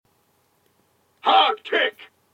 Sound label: Hard Kick